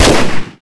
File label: Gunfire; Larson; Playable Tutorial Level